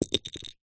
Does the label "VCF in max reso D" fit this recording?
No